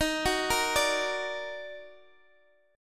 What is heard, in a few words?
Eb Minor Major 7th, Guitar Drop D